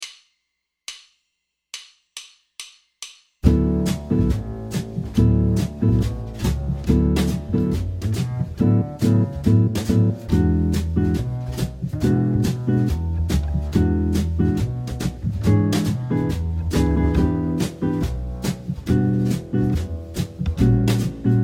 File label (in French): copains comping charleston 140, guitare jazz